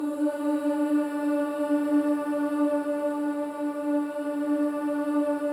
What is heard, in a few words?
BOYS OOS; Spectrasonics; Symphony of Voices